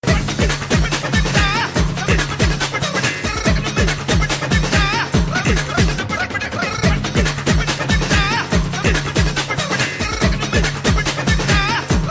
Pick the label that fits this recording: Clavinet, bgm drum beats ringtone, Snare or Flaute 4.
bgm drum beats ringtone